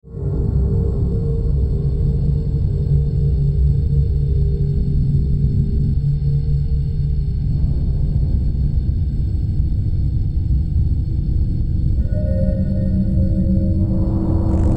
Bass Ambient Static, Composition Delays Static Hits; Blastwave; Composition Sound Effects